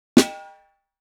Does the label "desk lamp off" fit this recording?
No